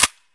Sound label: weap bolt in fast; Escape from Tarkov